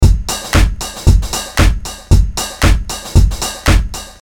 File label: Soulful Deep House